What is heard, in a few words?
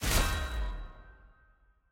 ui combat last hit